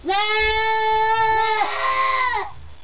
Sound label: kids screaming